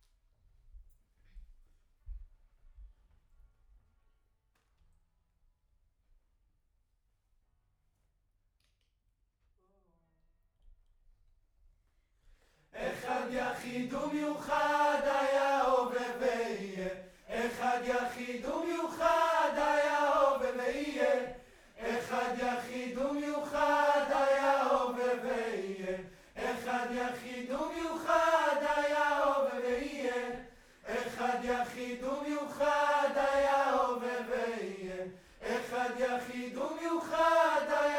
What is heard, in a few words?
Choir Far